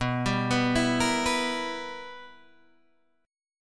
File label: B Major 7th Suspended 4th Sharp 5th Guitar Open G, chord